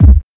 low tone kick c sharp key, loudest frequency: 92Hz; kick drum samples